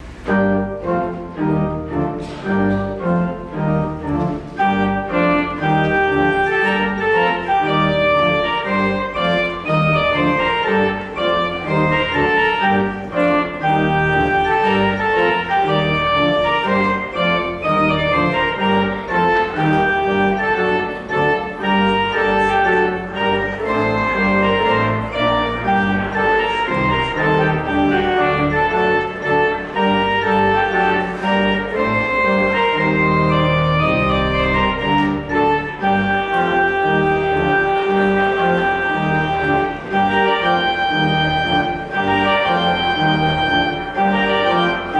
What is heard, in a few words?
Trumpet Tune; postlude; March of Kings